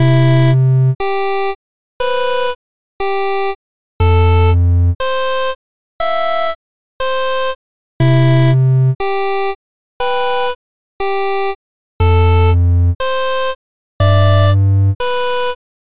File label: calm, music; mrpquest